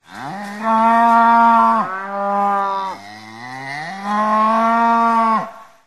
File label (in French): vaches